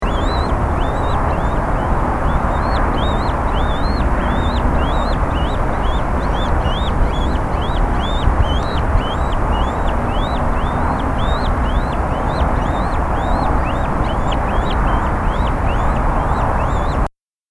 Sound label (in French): vautour percnoptere, chant